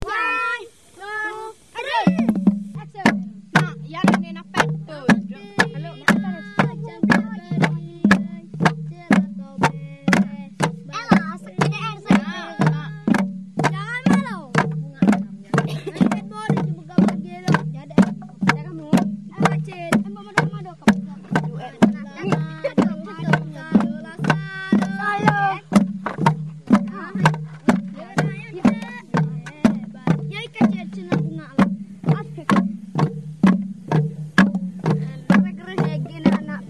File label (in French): malaisie children bamboo